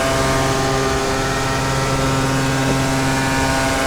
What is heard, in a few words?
KART IN OFF MID